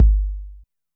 kick, Zoom MRT-3B

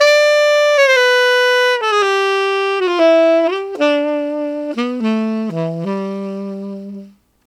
Tenor in G, Tenor Sax Loops; Sonic Foundry (Sony Creative Software); Jazz Solos and Sections

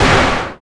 snare+hat; DRUMS

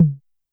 Tom Analog Soft, YAMAHA RM1X

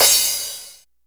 CYMBAL; E-MU Formula 4000 Series Vol. 1; Hip Hop Nation

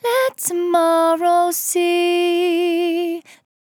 On A Star 65bpm F, Vocal; Zero-G

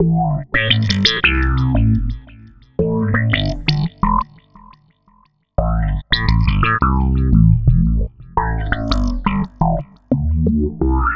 Processed Slap Bass, Electric Bass

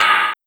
Noiz

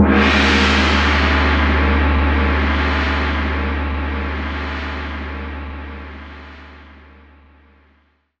Gong; Roland M-OC1

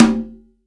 CONGA H; STEREO CONGA; Cuba

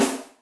Eltrc RIM, Electric Snare, Yamaha DTXpress

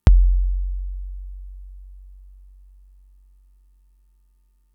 kick, Fricke MFB-522

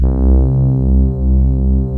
DX POLY PAD

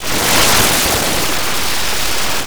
NEW SEA 2, granular; Disjointed Textures; USB Soundscan vol.33; AKAI

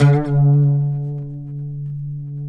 PLK OUD; Roland - LA Composer vol. 3

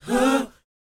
BOYZ, Vocal, R&B Dance

